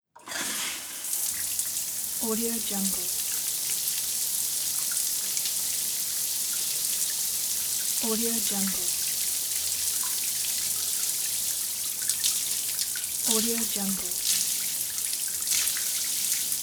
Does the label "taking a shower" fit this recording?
Yes